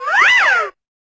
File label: Cartoon Sound Effects; Cartoon Sound Effects 100% Best; pilot grapple fire